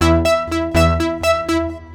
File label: Simple Arp, Keyboards